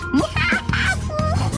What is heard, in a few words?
laugh, muhaha; morgana